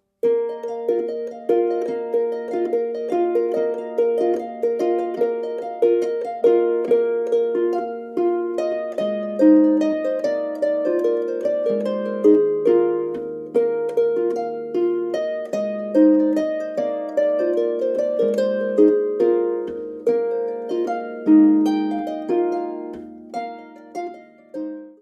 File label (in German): Tanz